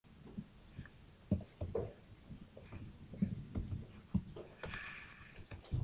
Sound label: wheel